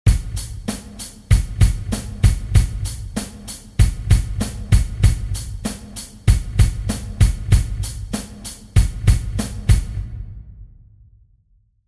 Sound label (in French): doubles rythme de base, batteur